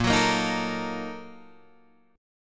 Bb Major 7th Suspended 4th Sharp 5th, Guitar, Open G; 8,11,8,8,10,8